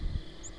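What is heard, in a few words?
Savannah Sparrow, nocturnal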